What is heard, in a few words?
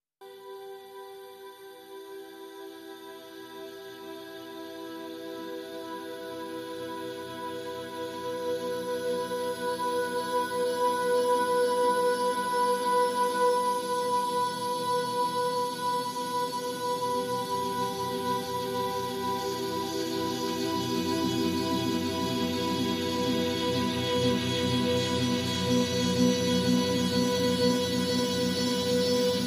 Ambient